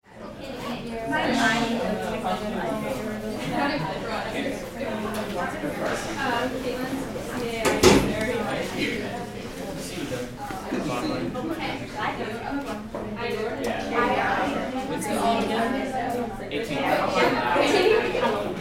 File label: Middle School Walla Interior